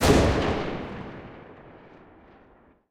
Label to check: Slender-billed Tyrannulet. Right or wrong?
Wrong